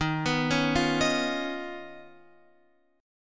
D sharp 7th Suspended 2nd Sharp 5th, Guitar, Open F; arpeggiated; x,10,11,8,8,10; D#7sus2#5; JGuitar